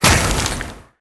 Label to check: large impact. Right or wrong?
Right